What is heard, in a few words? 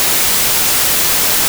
sig noise